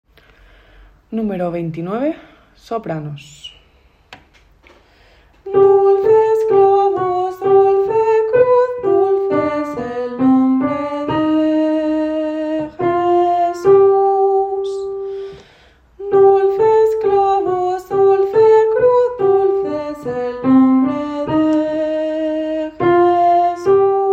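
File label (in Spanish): grito soprano; Bodas de Sangre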